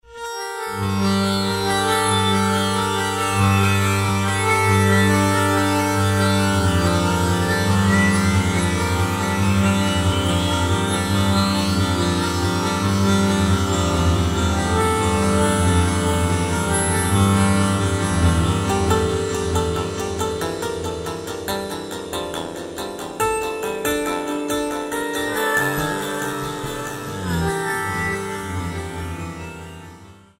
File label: Reversed, Roland V-Synth; Factory Patches; ReversedTrek